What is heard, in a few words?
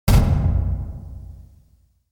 dumpster hit